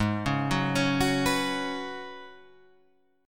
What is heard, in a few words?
Db 7th Suspended 4th Ab, guitar